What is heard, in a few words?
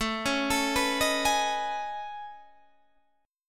A Major Sharp 11th, Guitar DADGAD